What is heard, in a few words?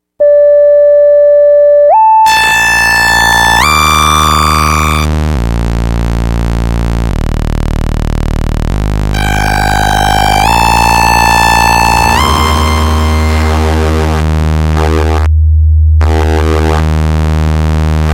Wave Splicer; Plan B